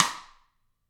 GRETSCH SNRR, Drums; ILIO; Double Platinum Drums 1

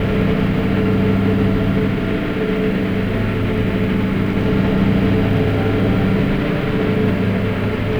Drone Ambient; Drums & Drones

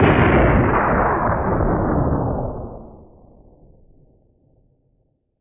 Explosion; Roland BK-5

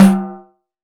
Pandeiro Open; Roland BK-5; Percussion

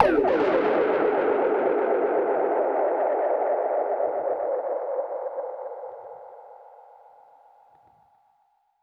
Perc Hit, dub percussion one shots; musicradar

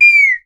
BD CALL, BIRD CALLS; Voice Crystal - Steve Reid Definitive Percussion

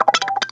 Gtr Wacka Funk Up 113; Mo' Phatt; Digital Sound Factory Mo' Phatt

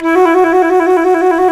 C flute trill

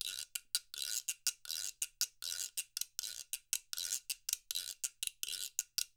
Percussion works loops; Sampleheads; NYC Percussionworks; ST LOOPS 80

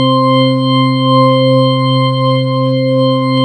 ORG ORGAN, ORG Mini Church; Sound & Vision; Gigapack I CD 2; ORG B-3 ORGANS

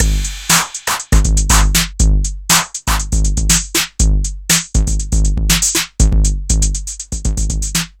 808 OVERDRIVE; Wizoo - Platinum 24 Electronic; DEMO KIT

